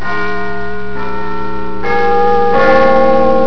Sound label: big ben